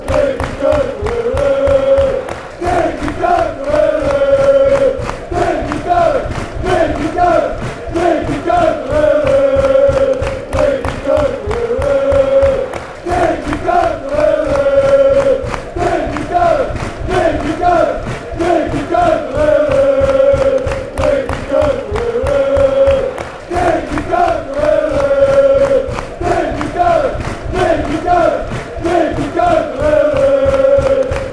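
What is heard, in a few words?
fanchants, football chants; dirk kuit ole ole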